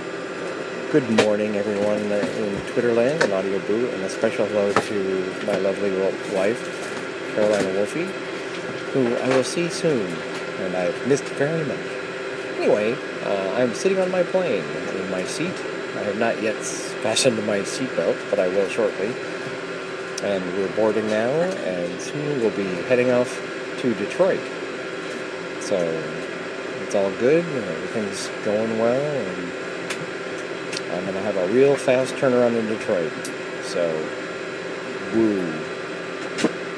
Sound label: plane